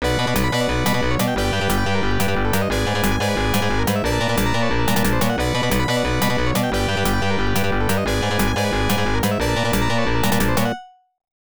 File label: Unused Jingle; Sadari; arcade